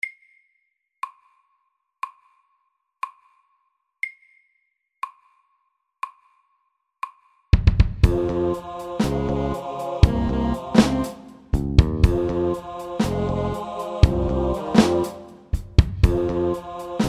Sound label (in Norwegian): Octave rap SAKTE